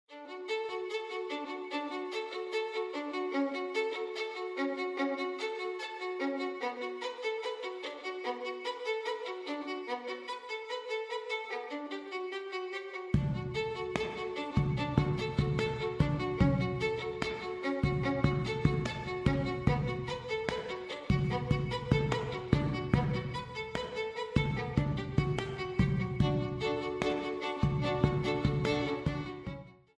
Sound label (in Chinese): 专题片背景音乐